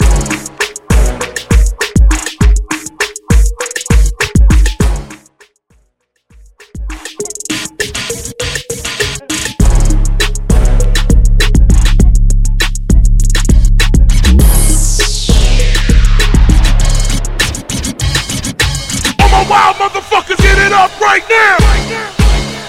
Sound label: Top40 Hip Hop